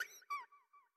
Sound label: Bat Aggro